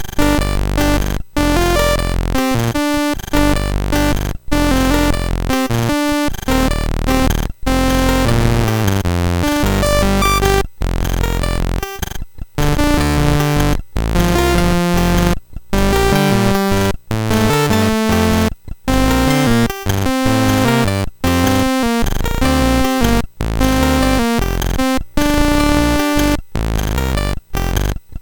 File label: sid MOS6581R4, GAMES; Break Dance